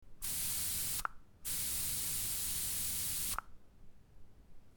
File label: air duster short